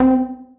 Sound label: popup